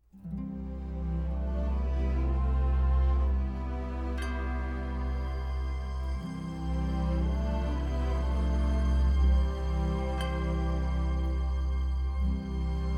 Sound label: Soprano; Go to Dark Gethsemane